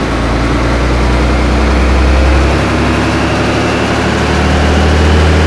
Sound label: first, vehicles; ratmobile